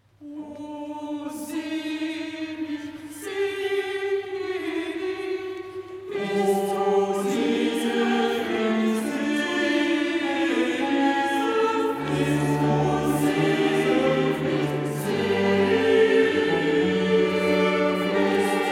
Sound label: CHOR; O selig